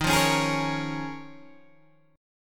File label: D sharp 7th Suspended 2nd Suspended 4th, Guitar, Open G